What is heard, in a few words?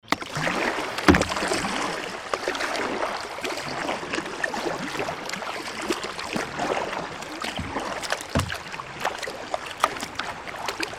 kayak